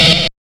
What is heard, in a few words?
HARD 130 FX, Big Beat; USB Soundscan vol.25; AKAI